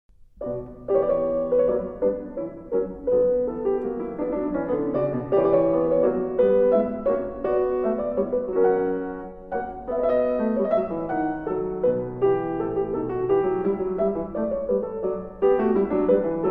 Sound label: French Overture for keyboard in B minor, Passepied; Johann Sebastian Bach; Gallery Of Classics- Bach; BWV 831